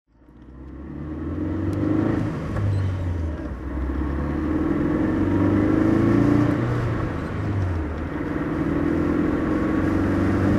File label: morgan 44 zetec driving